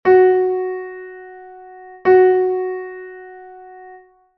enharmonia

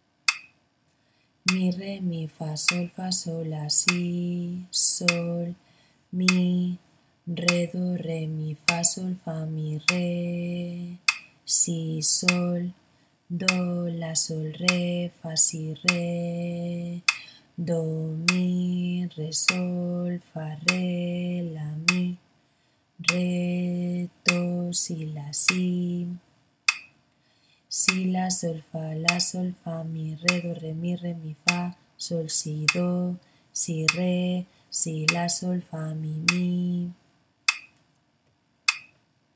ritmo 6-4; Música sen compasear

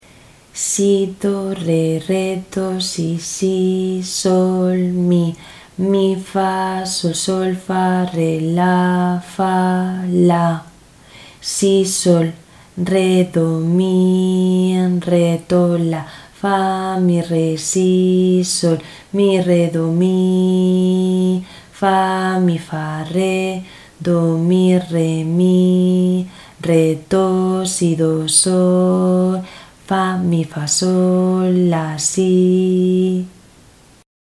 ritmo 6-4; Mixto

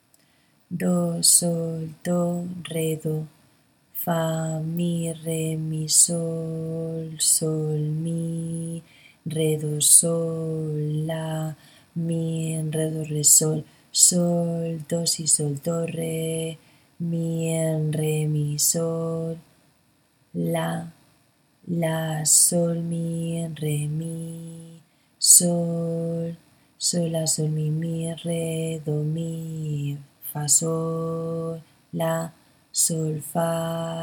ritmo 6-4

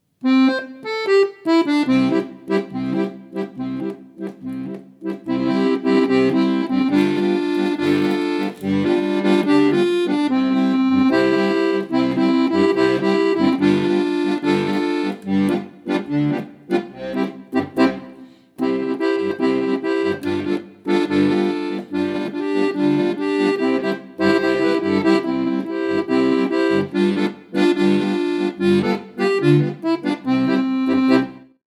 samba lele acompanamiento acordeon

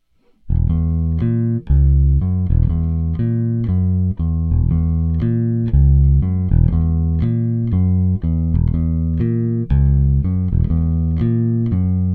bajo electrico

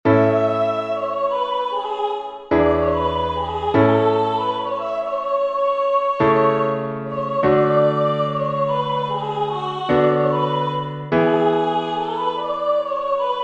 entonacion piano; Entoación con acompañamento; Melodía e acompañamento

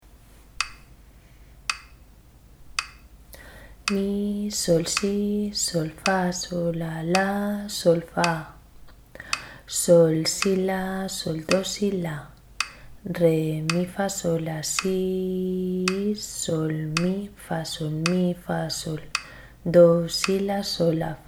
ritmo 6-4

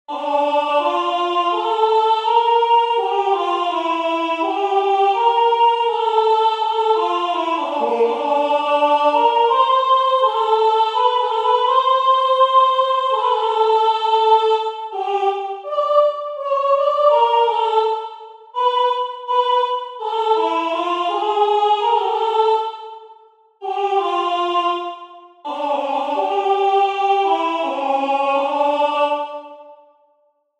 entonacion piano Voice; Só voz; Entoación con acompañamento

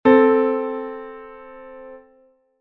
intervalo teoria

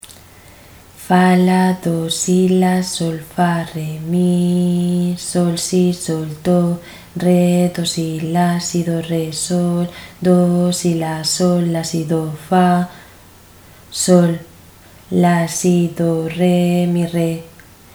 ritmo 6-4; Dispar

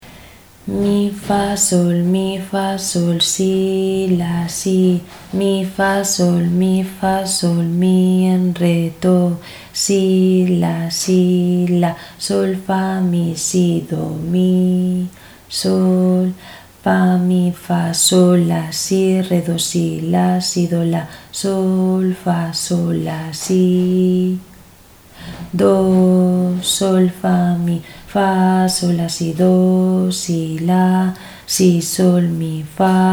ritmo 6-4

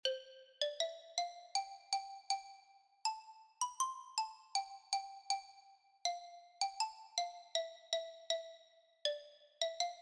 Xilofono Soprano Orff; Debajo de un boton

